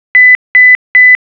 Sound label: Ota Tone; Samsung SGH-i740; System Sounds